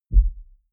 sub bass soft

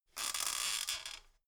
folding chair squeak

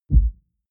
sub bass

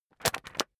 magazine skim bright

